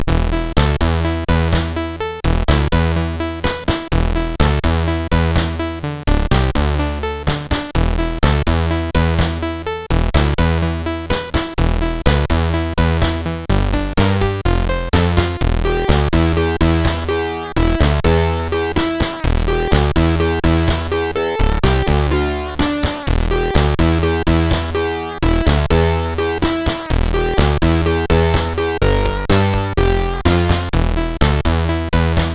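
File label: Lights On, MOS CSG8580R5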